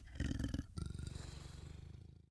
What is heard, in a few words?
ORC AMBIENT